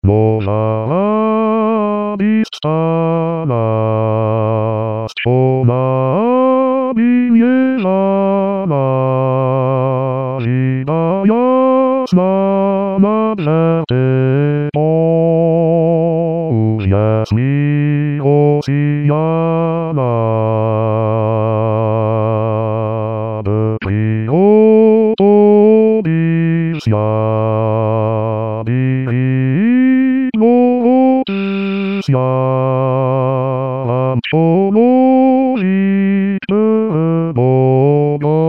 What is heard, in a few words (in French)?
noel ukrainien basse